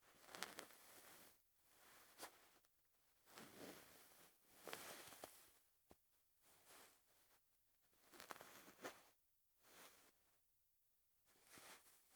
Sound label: cloth; クッション・枕の端をつまんだりする音